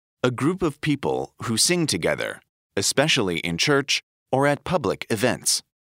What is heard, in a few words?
def choir